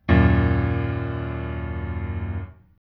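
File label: D#m lowest, chords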